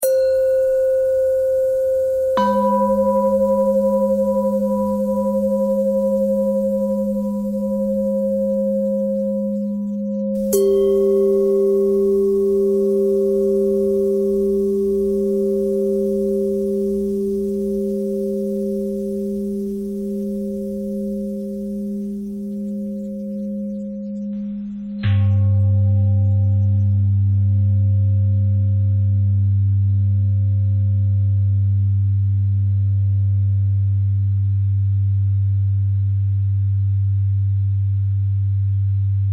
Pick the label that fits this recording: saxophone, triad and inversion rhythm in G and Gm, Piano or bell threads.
bell threads